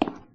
tile step; Golden Crayon Sounds

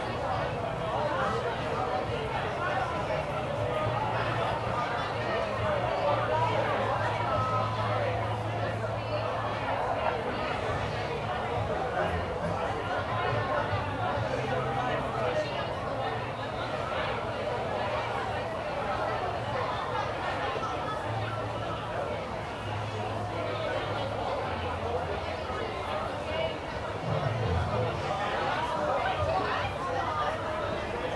crowd; Golden Crayon Sounds

